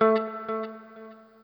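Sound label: noodle short, guitar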